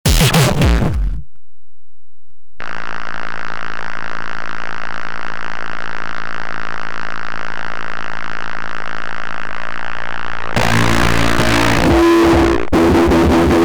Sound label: burp hardstyle burp